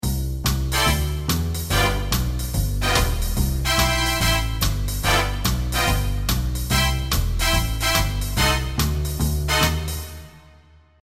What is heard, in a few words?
lonely big band, loops